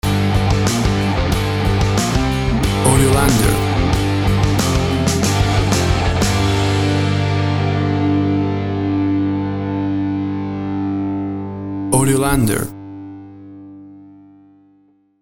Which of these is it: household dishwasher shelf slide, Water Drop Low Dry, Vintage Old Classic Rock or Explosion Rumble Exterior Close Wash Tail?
Vintage Old Classic Rock